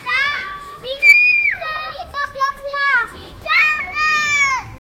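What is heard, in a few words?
Brazilian children playing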